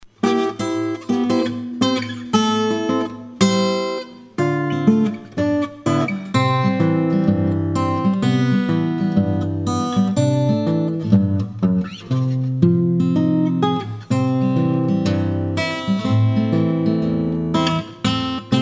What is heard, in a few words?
Instrumental